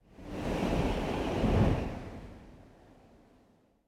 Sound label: wind buffet; ModernWarfare